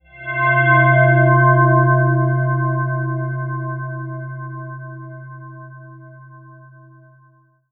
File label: Tubular Bell Pad C4